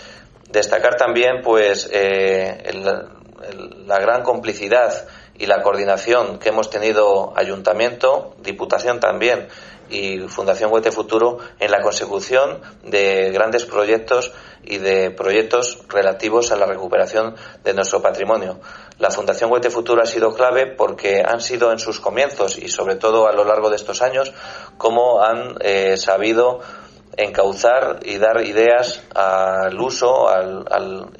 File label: jazz